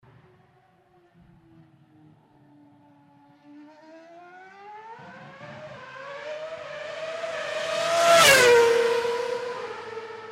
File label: mbgp car passing very fast; f1 sound